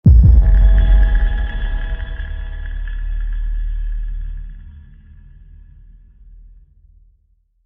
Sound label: alert; misscircle